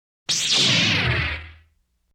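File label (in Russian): включение лазерного меча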